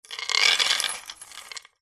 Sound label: industrial chain small set down wood